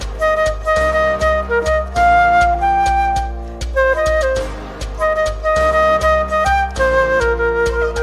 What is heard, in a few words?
flute cover